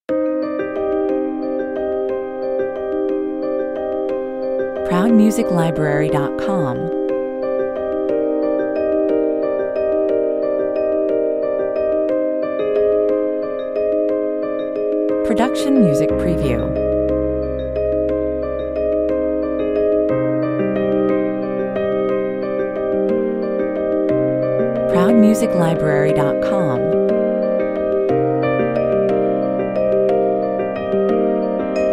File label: delicate and contemplative, 90 bpm; A tribute to the master of twisted melodies